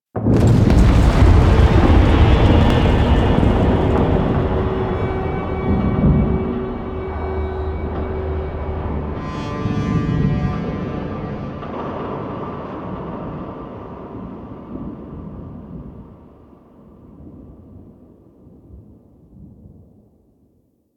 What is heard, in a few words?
Large Arms Move Start; Warframe; Duviri